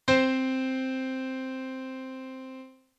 Intervall; theorie; Intervalle

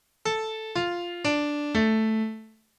Akkord G; theorie; Akkorde

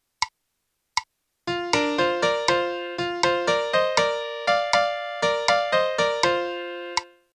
melodie; theorie; Melodiediktate

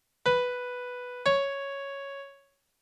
Intervall; theorie; Intervalle

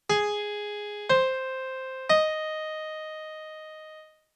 Dreiklang D; theorie

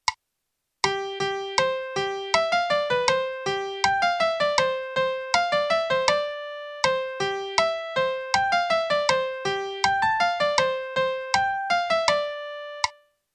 melodie; theorie; Melodiediktate

